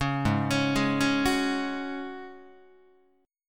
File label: Ab 7th Suspended 4th Ab down Guitar; Ab7sus4 arpeggiated; 4,4,4,6,5,5